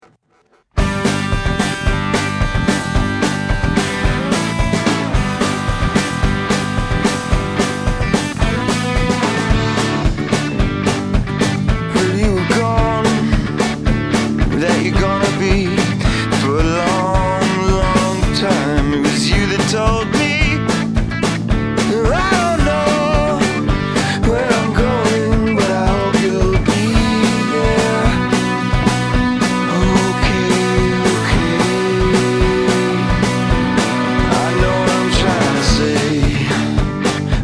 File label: new drums